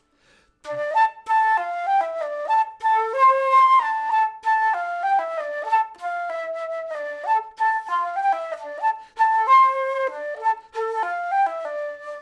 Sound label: flute